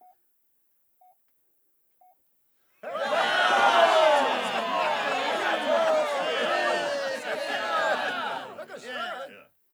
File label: Big Cheer